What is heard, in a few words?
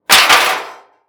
Impact Metal Drop On Concrete Bounces Twice Fast; Impacts